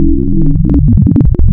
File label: Digital Additive Tones; Synthetic's Serum Collection; Echo Sound Works Core Tables